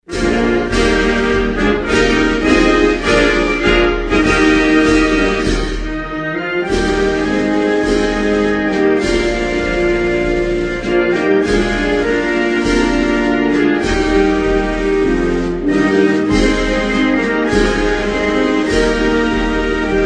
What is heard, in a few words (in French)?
Seychelles, Hymnes nationaux